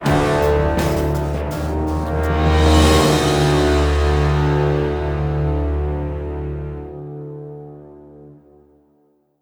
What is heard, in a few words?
sting endgame lose; Ember Strike